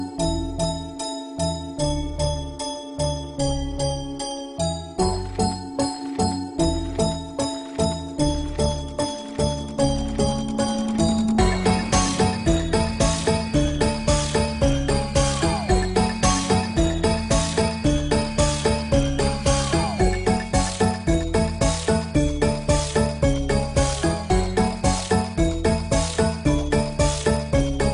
Windows 7 Bell Mix

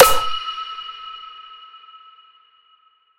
Big Snare

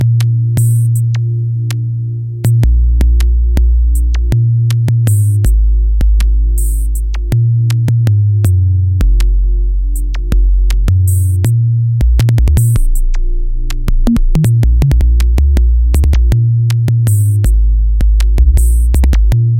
Bass Tester

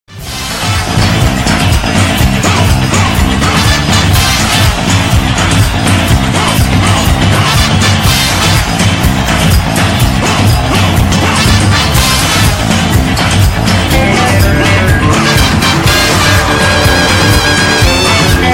Cricket Cup Mus

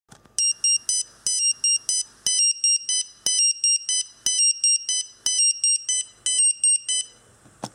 alarm

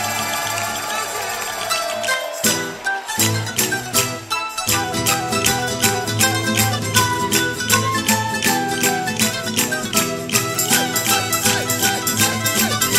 Tarantella napoli

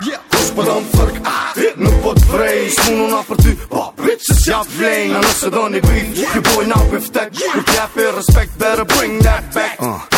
Ringgtone

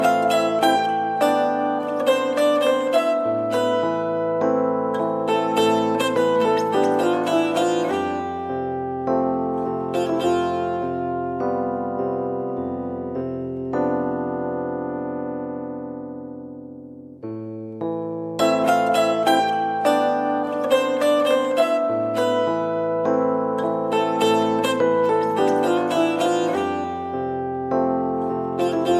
Baglama

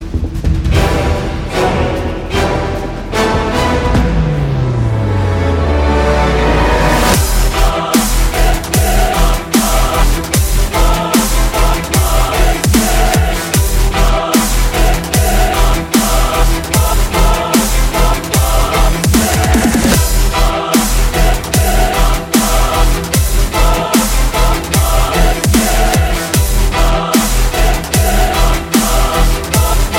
march

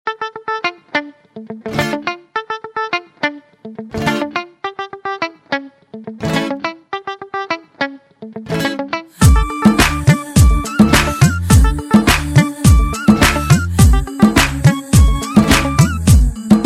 Cool Music

